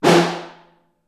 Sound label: Snare Far